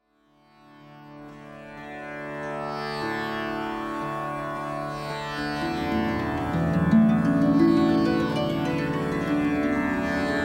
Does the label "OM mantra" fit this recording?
Yes